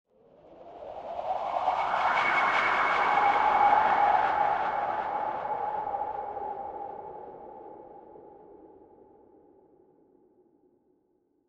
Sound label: Horror Wind Swell Ghostly High Filter; Monsters; Blastwave